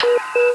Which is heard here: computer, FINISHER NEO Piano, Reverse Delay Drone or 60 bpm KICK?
computer